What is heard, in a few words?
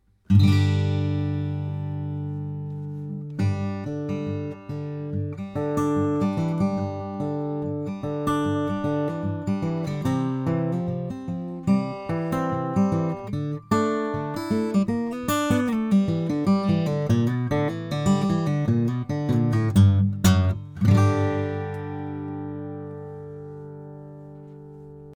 Collings OM2 Wenge